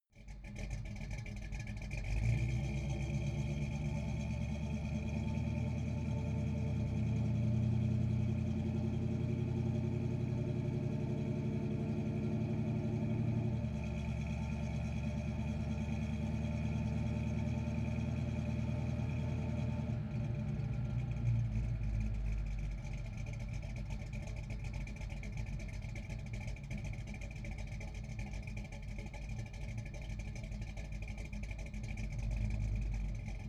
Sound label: car camaro accelerate decelerate exhaust